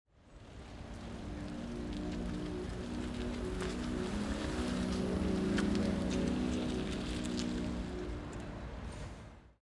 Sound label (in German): fahrgeraeusch e pkw rueckwaerts ausparken; auffaelligkeiten e autos bei unfaellen